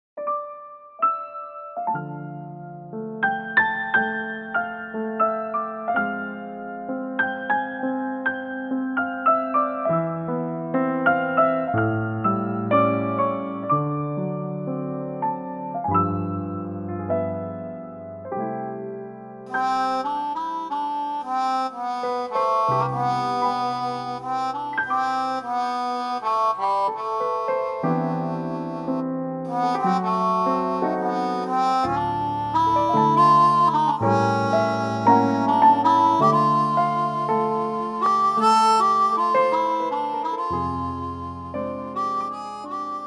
C, armonica

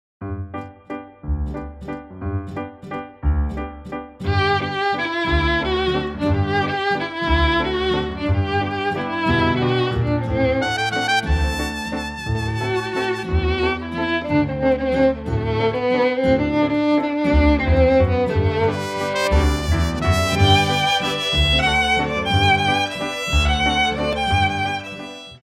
play violin reference

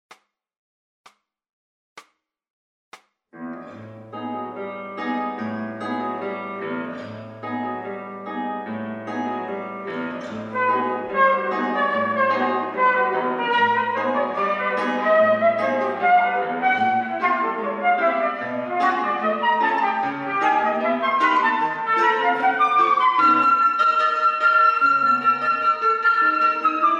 guitar play flute reference

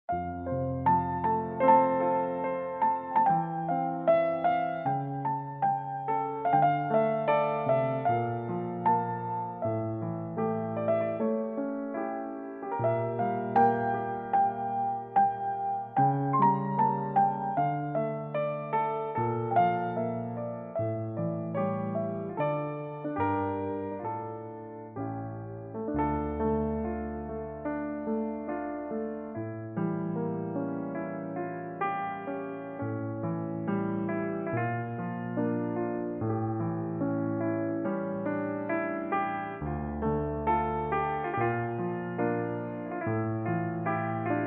piano

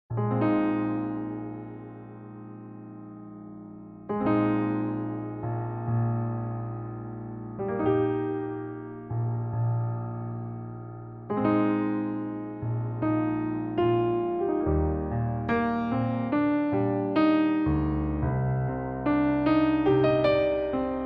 Eb piano

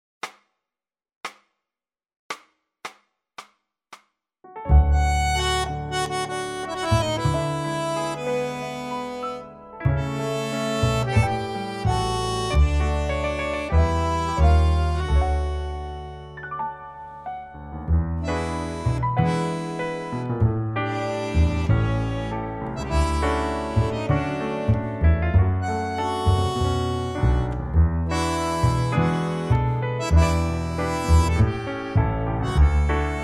D

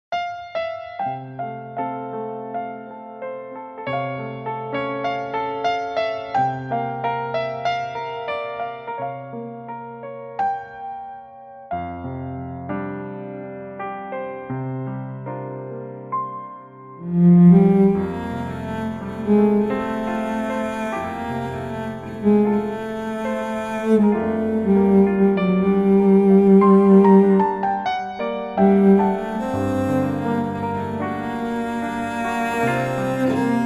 piano cello

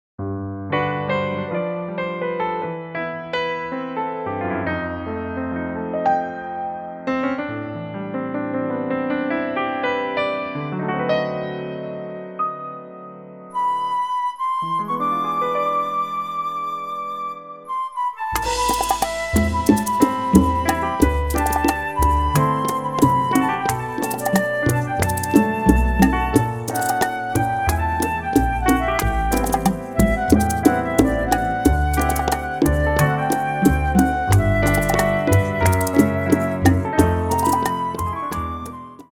flute, G

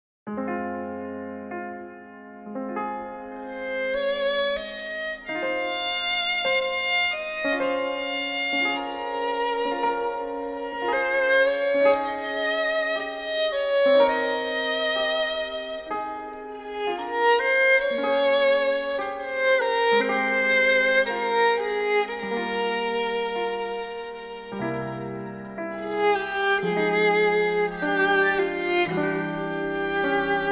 cello

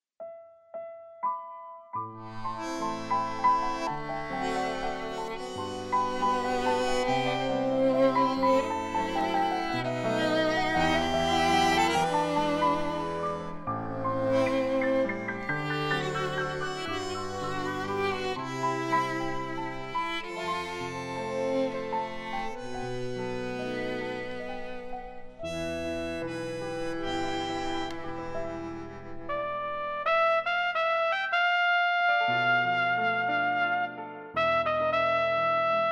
Em trumpet

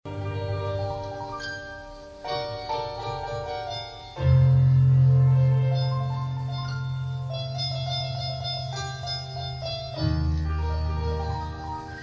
harmonica

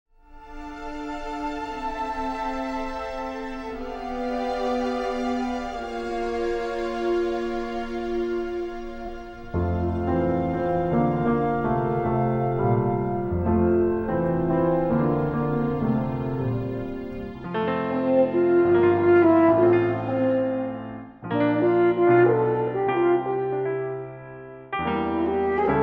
Horn; toy story 2

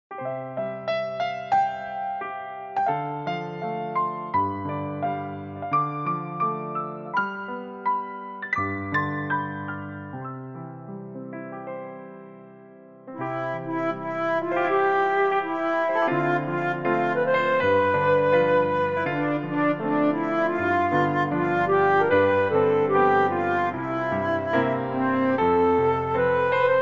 piano flute

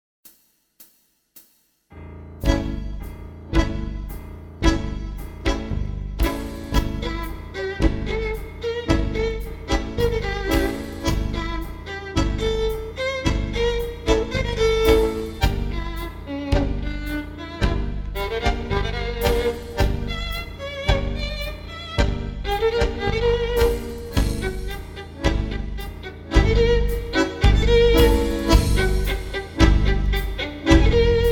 tango

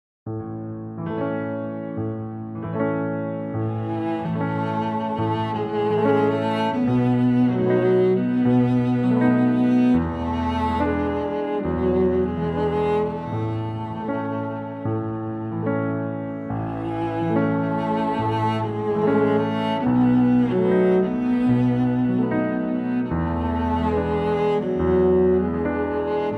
A play reference cello

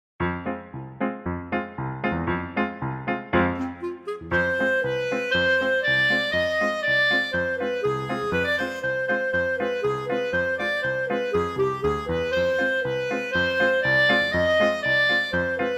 Fm klezmer piano play reference clarinet

